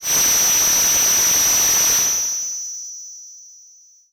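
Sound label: Breath DX100 F sharp 7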